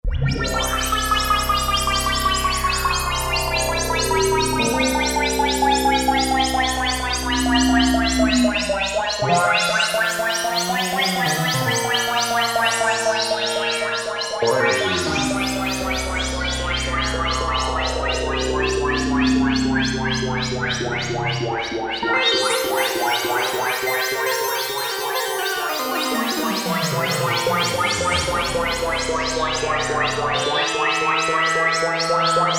Double - RESONANCE SWEEP + ALIEN CRAFT, Oberheim OB-8; Splits & Doubles